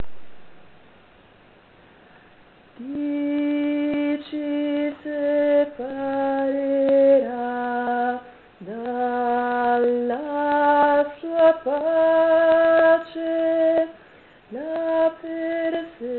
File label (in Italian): contralti; chi ci separera